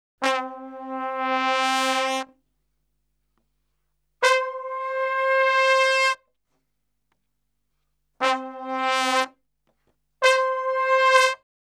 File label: Salsa Picante; Masterbits; World Zone VOL-3